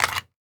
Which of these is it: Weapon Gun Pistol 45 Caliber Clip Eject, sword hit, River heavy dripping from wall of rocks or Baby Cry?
Weapon Gun Pistol 45 Caliber Clip Eject